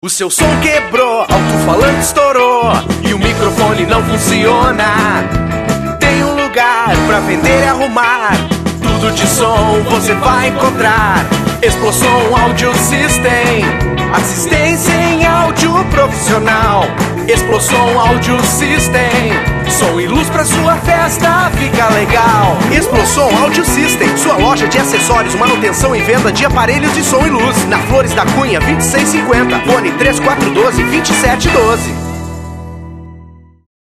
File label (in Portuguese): jingle; estudio gavetao; explosom